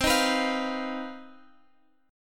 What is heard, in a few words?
C Minor Major 7th Double Flat 5th, Guitar, Open G; down; chord shape